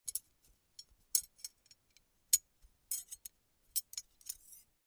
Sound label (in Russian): zvuki viazaniia